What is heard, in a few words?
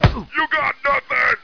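bullet hit flesh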